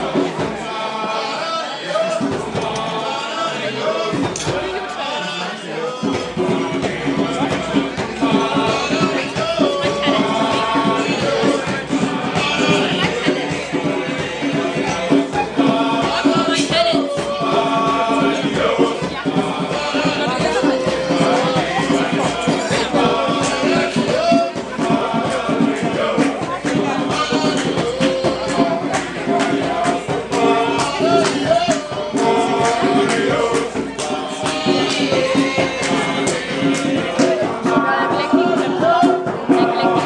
african drummers at my birthday celebration

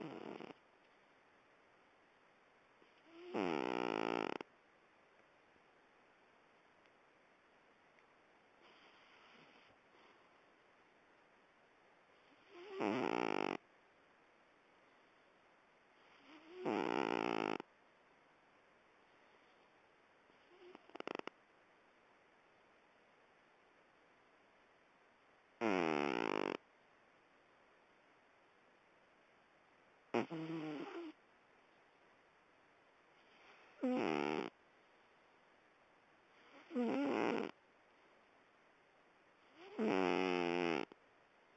you d giggle too

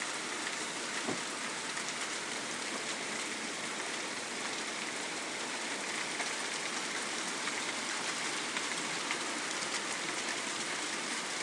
rain hail thunder and lightning

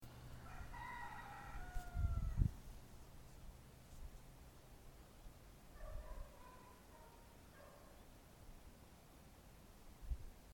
dogs and rooster, greece; possidi

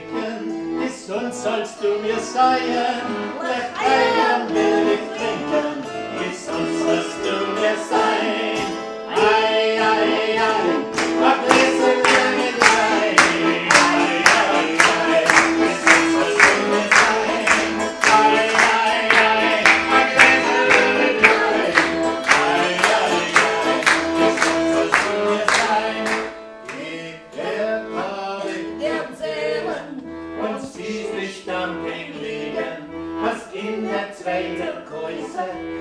klezmer music

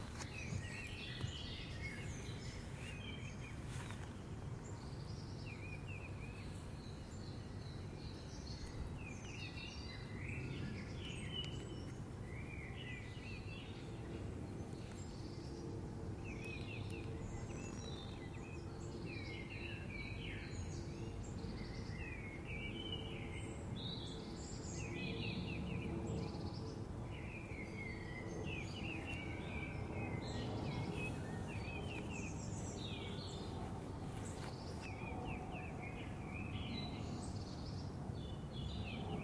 beautiful birdsong in the garden at teatime

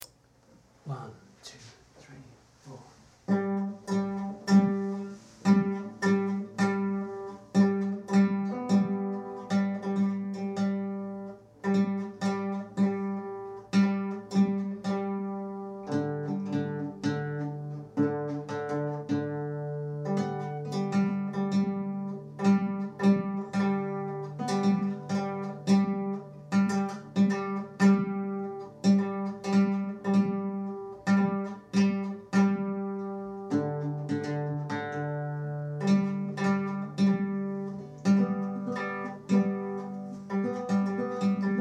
jingle bells

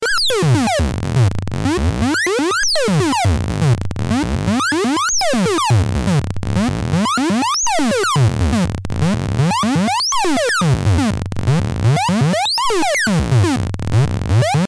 modular synth

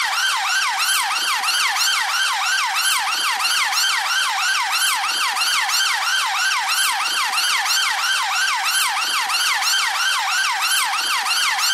police siren

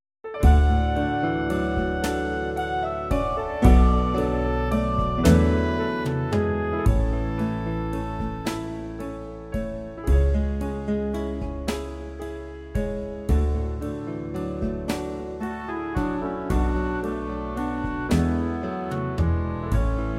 backing track

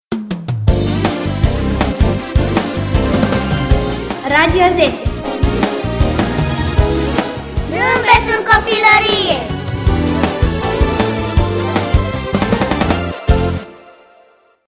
radio jingle; Radio ZC

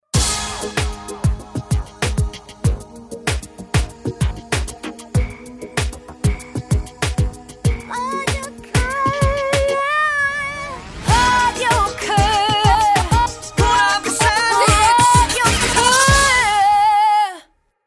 jingle; Radio Koge; produceret af Lydstudiet Aros

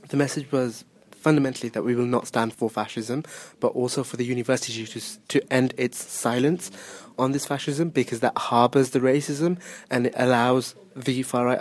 students hold demonstration; at university of birmingham

